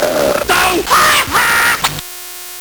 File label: Nintendo Gameboy LSDJ